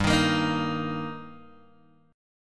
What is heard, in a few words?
G Minor Sharp 5th G Guitar DADGAD, chord